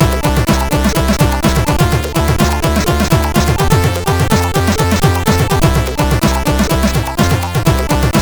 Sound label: sid 8580; CSG8580R5; Devilock Jokes; hvsc